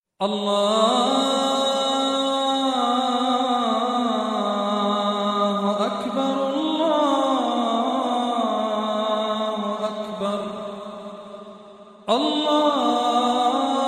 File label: Allaaah, Malayalam Ringtones